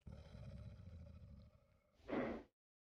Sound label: sleeping; Rust